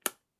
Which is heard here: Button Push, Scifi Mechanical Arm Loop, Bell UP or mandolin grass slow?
Button Push